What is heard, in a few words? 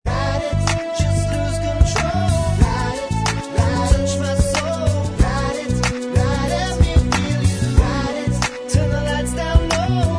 Bvox